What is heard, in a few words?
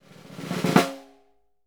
SNR, Synclavier Percussion 2 - World & Orchestral